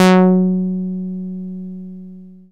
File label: Dance Floor Bass, Multisample Basses